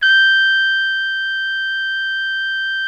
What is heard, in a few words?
BRASS G5, ORCH BRASS; Greytsounds VOL-1; ORCH BRASS 2